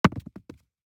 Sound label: DICE on Neoprene, Throw and Roll, Foam, 2 Two Dice; Samples; ArticulatedSounds; DICE Sfx Party (Extended)